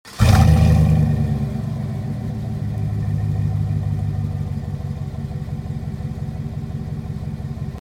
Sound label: v8 trailboss cat back exhaust; 5.3 v8